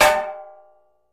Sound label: Metal Hit Ring, small impacts